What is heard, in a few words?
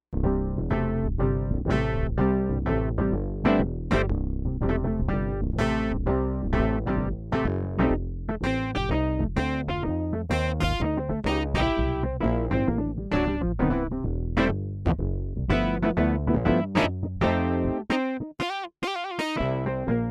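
Tube Amplifier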